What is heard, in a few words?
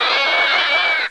screec; alienbreed3d2